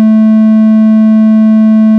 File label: triangle